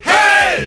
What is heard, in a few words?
VOX